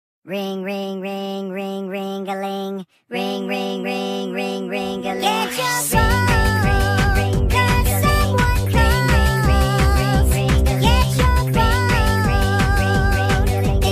ring ring ringtone; nhac chuong; minion

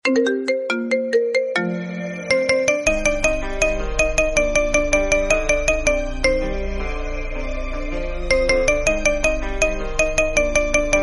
reggae rhythms; nhac chuong

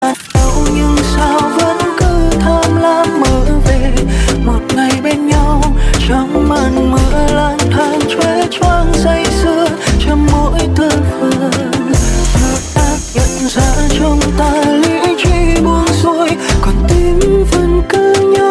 drill

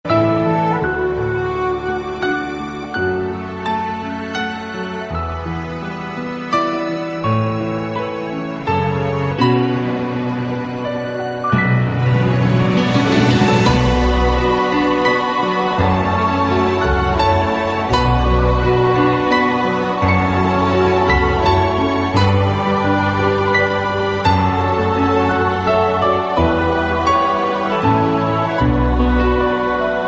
silent wind

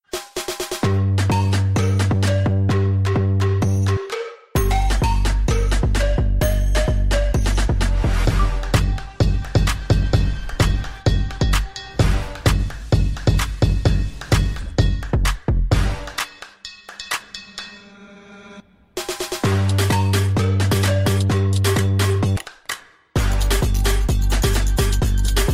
Nhac Chuong iPhone SE New

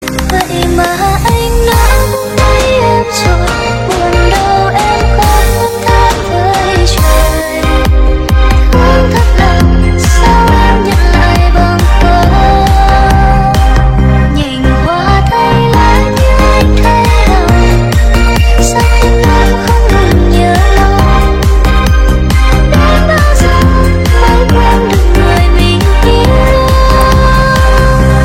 remix

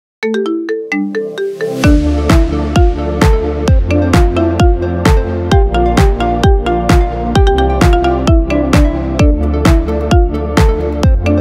Marimba Ringtone iphone

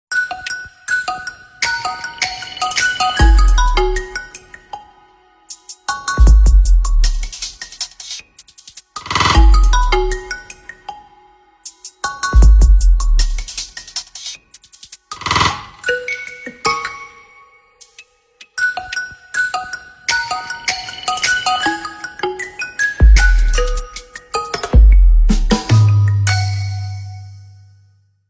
iphone 11 clokktastrophe

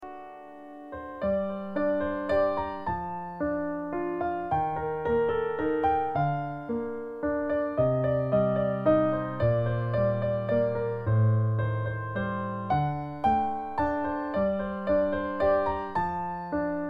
piano

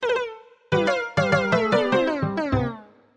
iphone tones

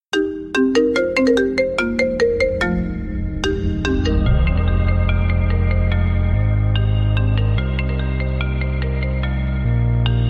iPhone Remix

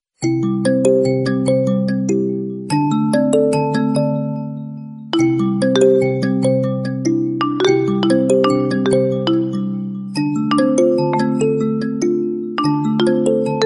vivo marimba; nhac chuong

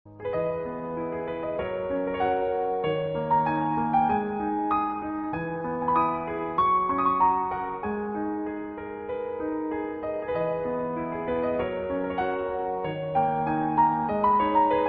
Piano

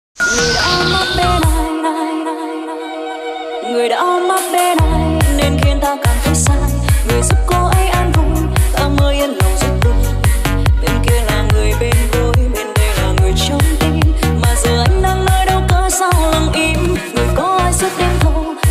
remix

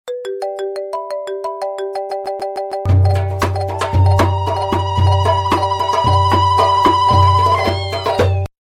iphone apple jungle mix

